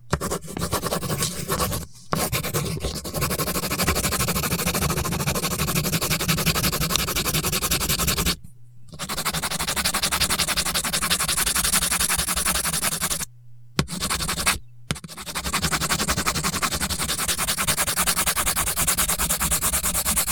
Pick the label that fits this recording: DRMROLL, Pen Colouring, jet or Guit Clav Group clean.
Pen Colouring